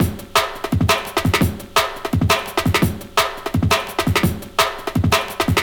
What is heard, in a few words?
Hammer Break, Drum & Bass, Beats & Breaks; Fluid Dynamics